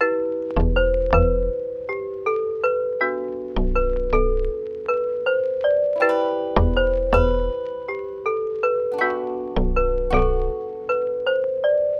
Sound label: Melody Loop 160 BPM E Min, Hip Hop; Melodics; Melody Loops; Cymatics; Cobra Hip Hop Sample Pack